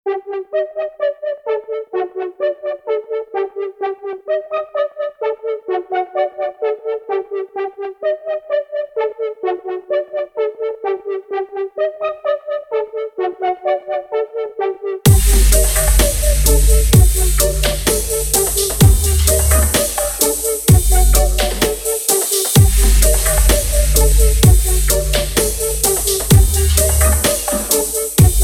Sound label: creepy